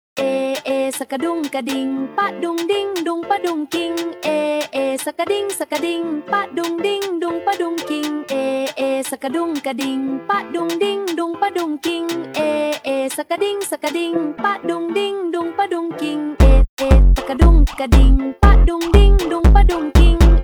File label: Funk ultra Slowed